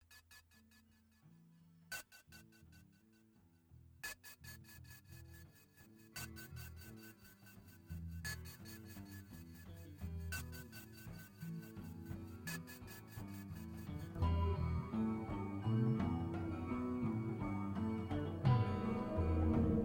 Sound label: engine